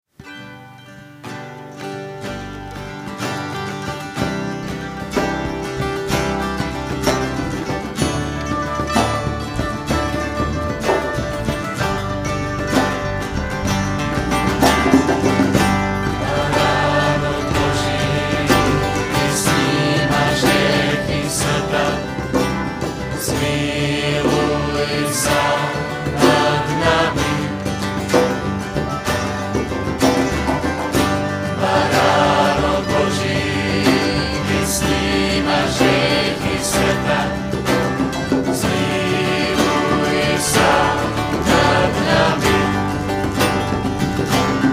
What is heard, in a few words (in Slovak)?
Piatkova Omsa - Baranok bozi